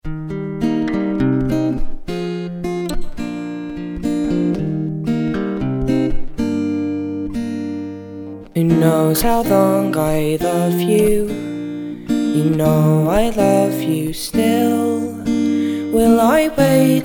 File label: Guitar Army